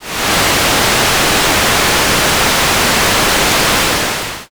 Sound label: rain